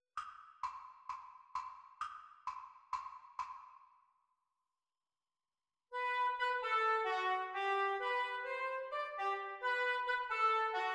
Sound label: Oboe Duets